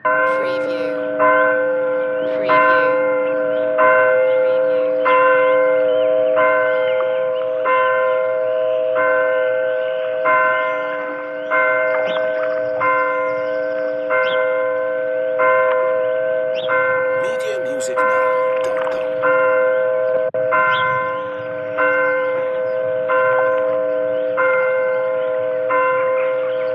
Church Vintage

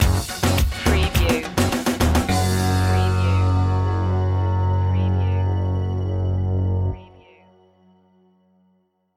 bollywood reggaeton stinger

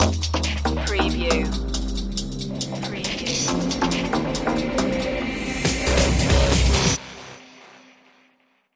thunder cat stinger

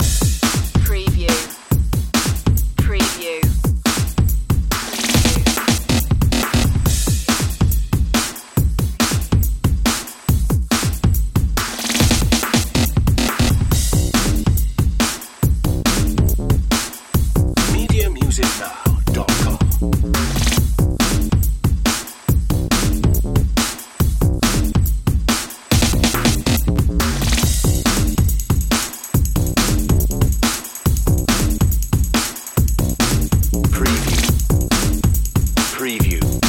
fatfunk

